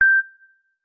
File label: samsung Beep once; Zange samsung